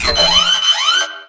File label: alarm; Dystopia; cyber